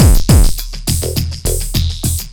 Processed Beat, Drums & Percussion

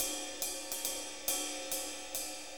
6-8 Ride, HiHat & Ride Loops

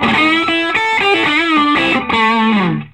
Vintage Blues Guitar, SOLO

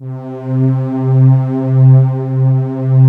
STR SYN, SYN STRGS

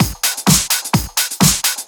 Straight Loop 128 BPM, House

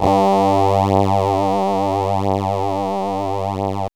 PAD L; AMG - Vince Clarke Lucky Bastard

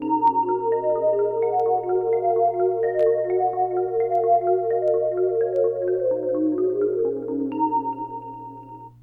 AMBIENT, SYNTH SEQ; AMG; Global Trance Mission VOL-2

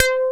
bass FM; Best Service Gigapack 2

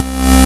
Basses Root C

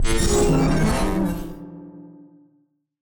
Battered Synth; malfunctioning synth samples

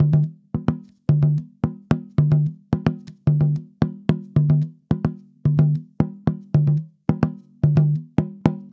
Tambora, African

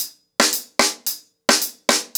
110BPM, ACOUSTIC DRUMS; The Roots Drumloops; Border Case

